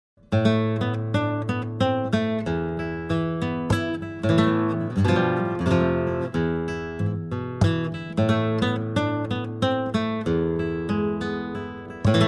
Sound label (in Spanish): soledad guit